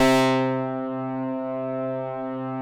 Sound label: MW PULS